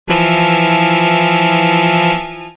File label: horn short